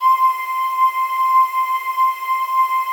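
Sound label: PAD, atmospheric pads; Best Service; XX Large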